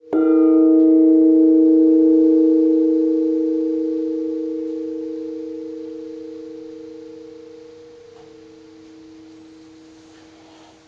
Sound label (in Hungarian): burmagong